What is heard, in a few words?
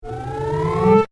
Double Alert Whoosh; Whoosh Sound Effects; Andrew Fleming